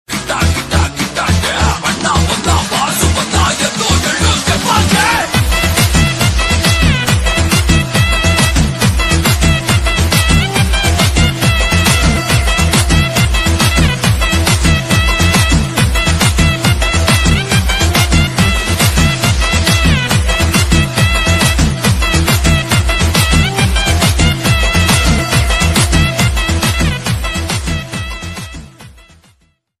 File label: BGM Ringtone, Tamil Ringtone; Pogaru